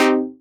Fm Dub Chord; CHORDS; Electronisounds; Enigma Dub Cube One Shots

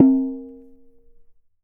CLAY FRAME, CAMEROON; AKAI S6000 CD-ROM - WorldSounds; CAMEROON PRC

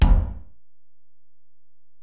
Ac BD, Alesis DM-PRO; 300 Drum Machines

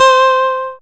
synth c, Top End Tinklers; Dance Synths

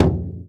BASS, INDIAN PERC

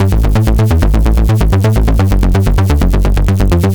ARP Bass, 128bpm, A, analogue circuit; musicradar

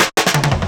D&B Rolls, Drums; neuro

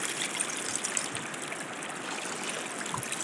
Icy Stream, On The Glacier

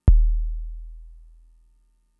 Kick, Drum Machines Fricke MFB-522

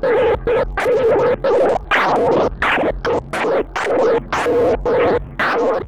Alien Chatter, sci-fi

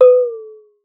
water; Xsample VOL-9

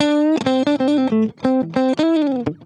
ACID JAZZ; Best Service Advanced Media Trax Modern Composer

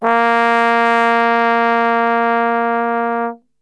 A#1 Brass Super Section; Best Service; TRMBSTRGHT; 90 s Sample CDs

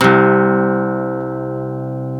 GTR, Dual Guitar, Ac 12+; Club-50 - Foundations

